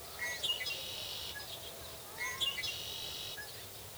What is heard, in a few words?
Red winged Blackbird; woods; Ariel Foundation Park